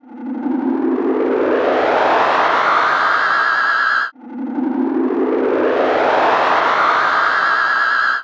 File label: Tape delay FB Loop; radiosonics